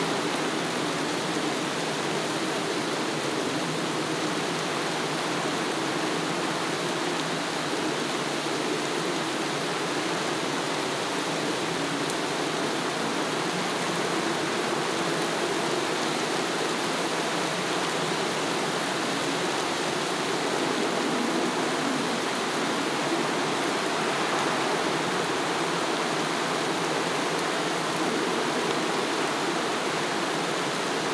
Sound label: ambience thunderstorm outside